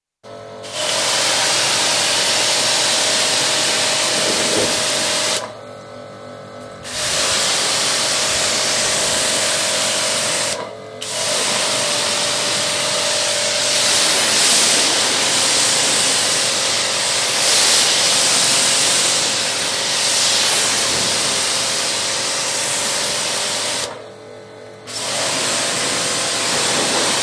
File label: Pressure hose, cleaning yard; Yard Tool; Yard Tools; Hoses Pressure; BBC